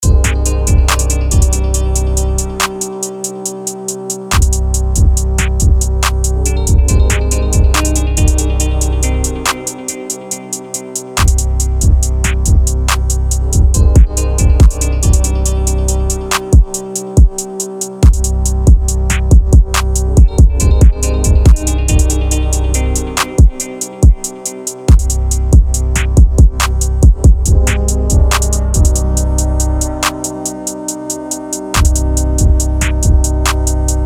old trance beat

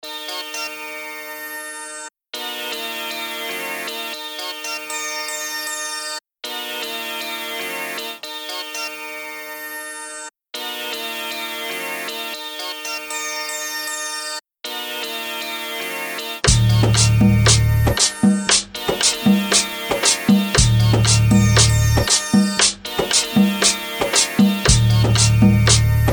D I think minor 117 bpm

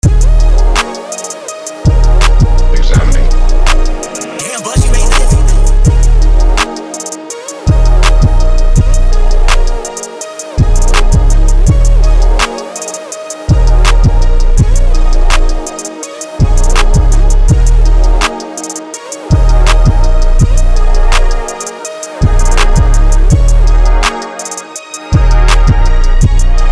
really good looping beat 165 D#

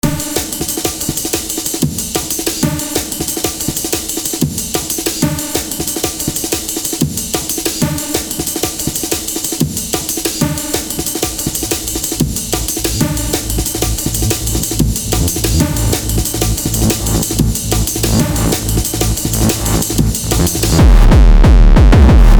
techno beats

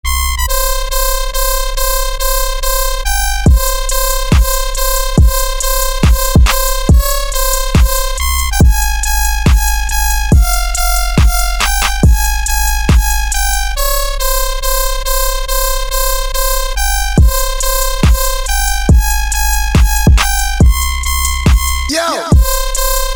dubsteppp

